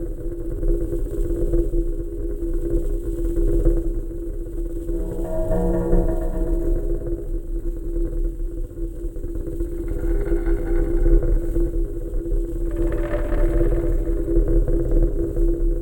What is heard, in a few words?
fs intro loop; gonzos gold